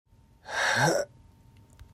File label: heeeeeh